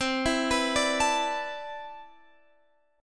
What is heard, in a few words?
C Major 13th, Guitar Open F, chord; CM13; arpeggiated; x,19,16,18,17,16